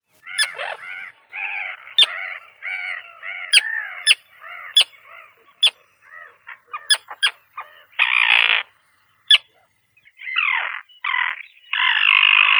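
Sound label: common tern, Sterna hirundo; krachka rechnaya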